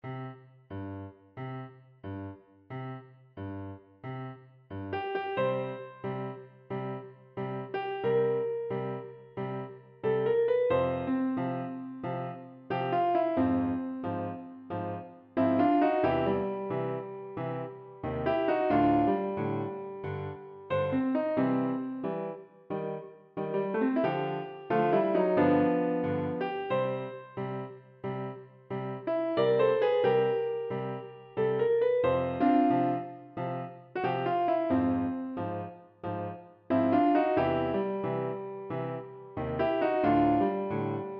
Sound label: Jazz For Piano